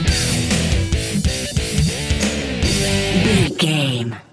rock power stinger; Sports; Pop Rock; NFL; Football; Sports Music; Hard Rock; Metal; Driving; Bikers

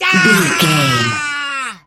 voice male battle shout long

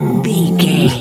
dog growl

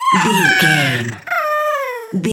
goblin fairy death

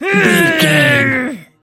voice female effort growl

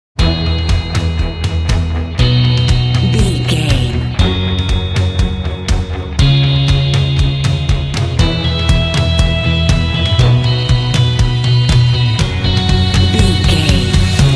orchestral rock adventure and sports inspiration

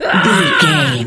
voice female battle shout

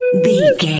whistle slide wobble notes short

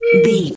whistle slide straight tone med

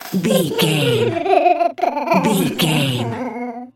goblin fairy cry whimper

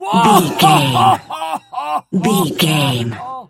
voice male laugh intense

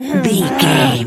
voice female growl frustrated

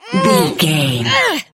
voice female effort push

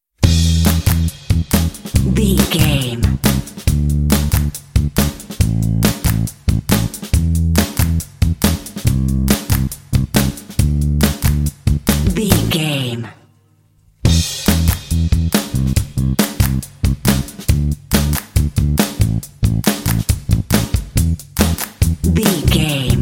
indie

